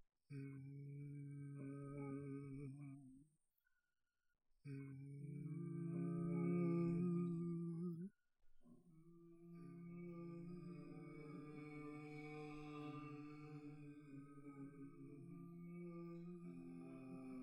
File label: hum